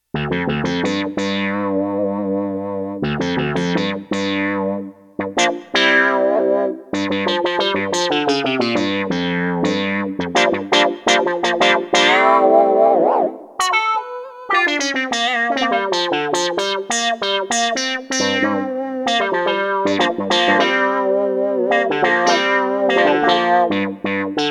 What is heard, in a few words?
Roland JX-1 Sweep A; Factory presets; Additional factory patches